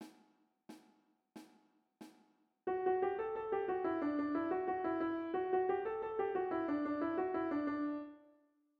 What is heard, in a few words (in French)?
ODE A LA JOIE en doubles croches